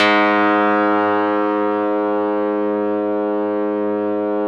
RESO METAL 2 G#2, Yamaha VP-1

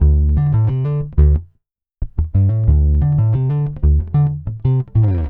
Funk, Bass; Sonic Foundry; Bass X

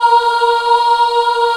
A#3 child oh, Choir; CHILD OHF; USB Soundscan vol.28; Choir Acoustic & Synth; AKAI

